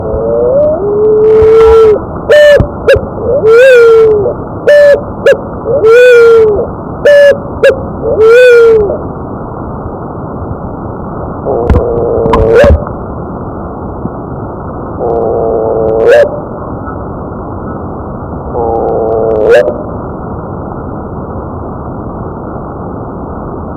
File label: Columba picazuro - Paloma picazuro